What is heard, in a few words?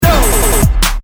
Turntable Scratching Beat Grid; DJ; Musical